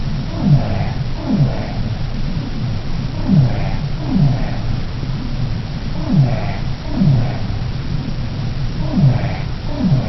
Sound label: minke